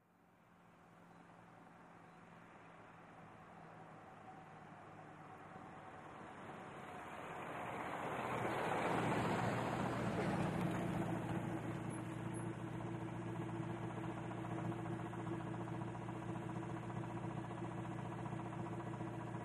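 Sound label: Buick, In Medium Speed, close up, Stop Medium Distant, Idle, Off, antiques; 1938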